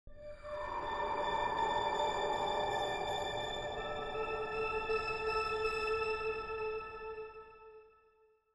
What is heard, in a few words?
Distant Wind Lingering Echo; LYN115 Trailer Production Elements